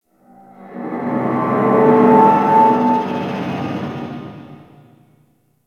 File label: Infested Distant Metal Groan; Warframe; Corpus Infested; Ambience